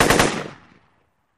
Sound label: Machine Gun Sngl Rap, single burst; Machine Gun Single Rapid Fire Burst